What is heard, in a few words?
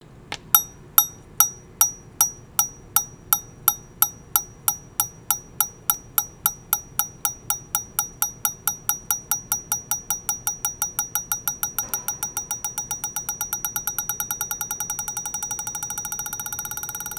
bouncing ball; ligo